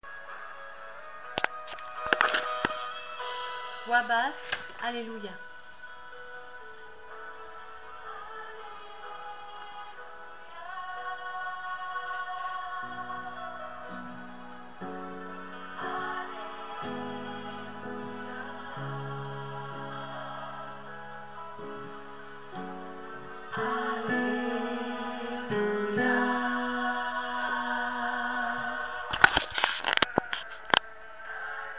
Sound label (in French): voix basse; Alleluia